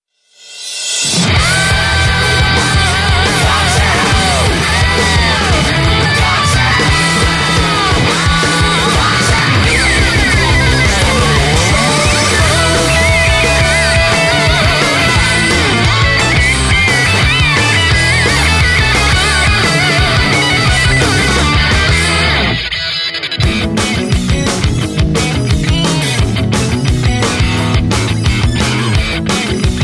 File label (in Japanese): カラオケ